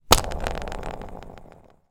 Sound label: twister game spinner spin